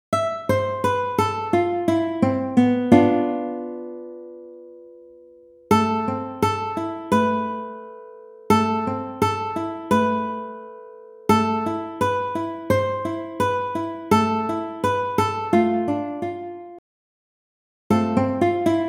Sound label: Chord Melody, dulcimer